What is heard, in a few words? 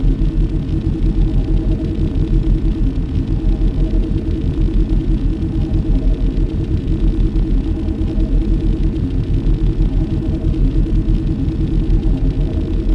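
ambience shield mag; Star Wars Knights Of The Old Republic II; XBOX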